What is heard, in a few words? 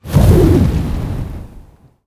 sandstorm start; sand king